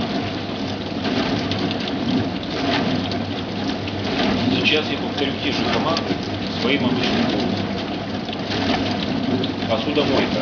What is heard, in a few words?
wash mashina, noise; NONSTATIONARY NOISE